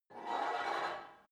take off weight barbell quick, fitnessstudio

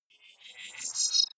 wobble swell robot; sweeps-swells

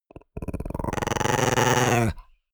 chihuahua growl creak

